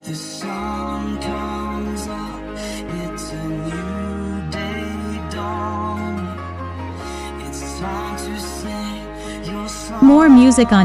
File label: Drill Version